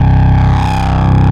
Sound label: M-Audio Venom SawzallBS C1